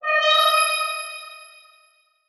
Transition Musical Tone Medium; Production Element; Title Transition; AdobeCC

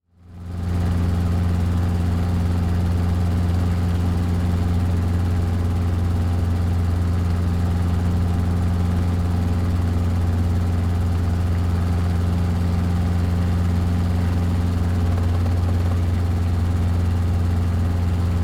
Transportation Helicopter Idling Interior

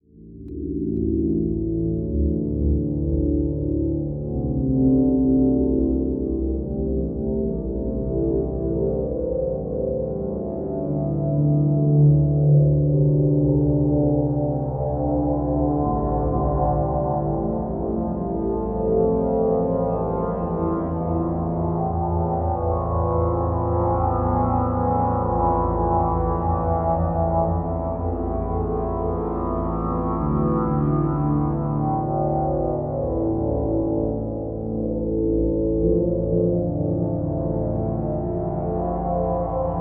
Drone Bed Melodic Flanging; Production Element Imaging; AdobeCC

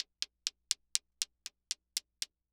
Impact Wood Blind Person Cane Multi Hit; Impacts

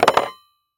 Industry Tool Tire Iron Dropped On Wood Floor

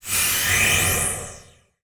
Transition Whoosh Laser; Production Element

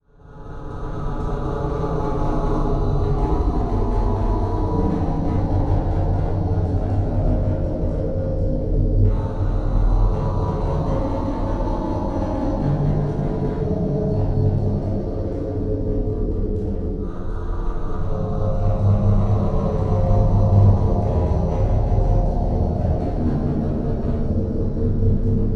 Title Transition Stab Dark Demonic; Production Element

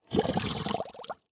Liquid Water Water Plastic Bag Fill With Water

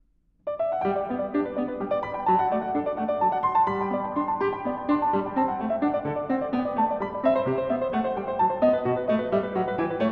Partita No. 5 in G; J.S. Bach; Partitas; BWV 829

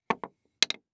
Industry Tool Hammer Set On Pavement

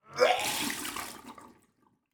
Human Male Vomits In Bathroom Sink

